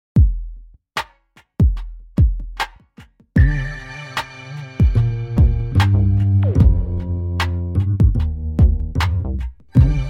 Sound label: Electronic Downtempo